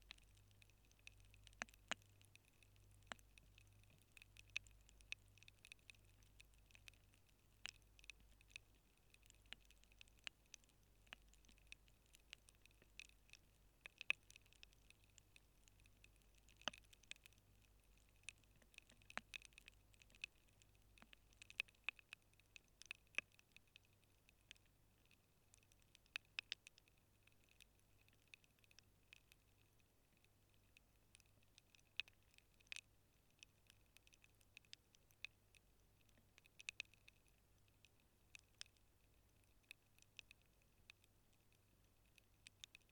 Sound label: rain choir; sebastiane hegarty